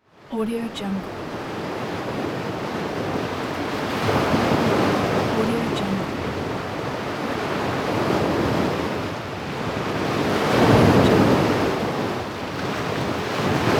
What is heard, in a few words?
sea waves sound